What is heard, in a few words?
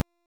Alt Fire Shot; Warframe; Scaldra AK